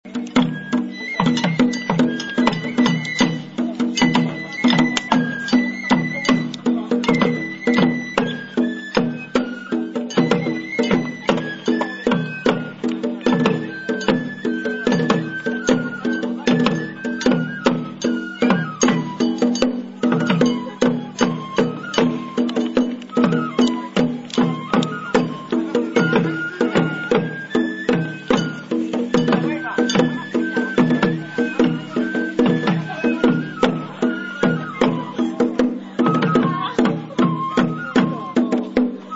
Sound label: banjo